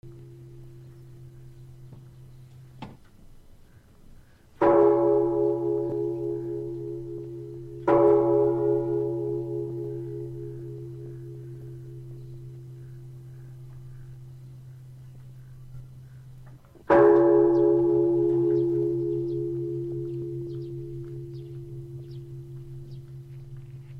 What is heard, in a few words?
asia temple bell and drum